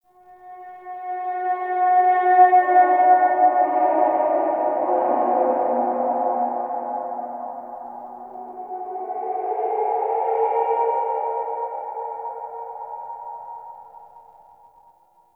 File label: Eerie Metallic Moans; Warframe; Tau